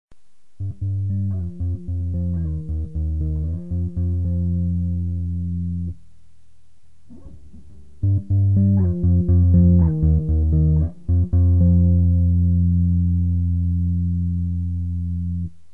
comp, Effets bass

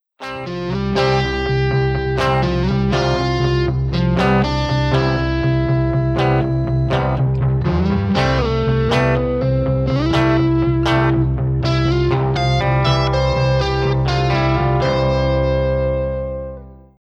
Mode I Ionien, Modes

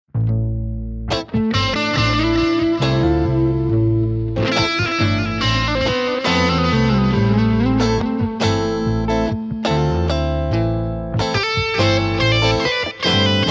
Mode VI Aeolien

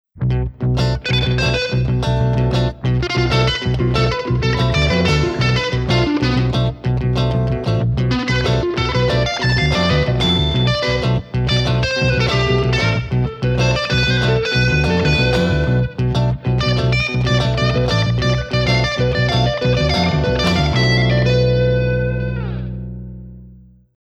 Mode VII Locrien

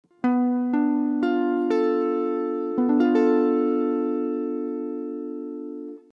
7eme Degre Bm7b5, Harmonisation

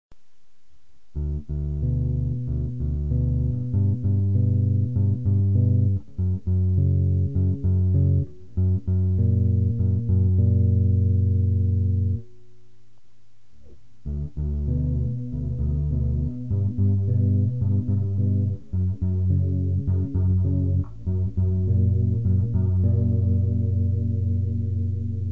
chorus, Effets bass

